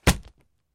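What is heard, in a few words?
police baton sounds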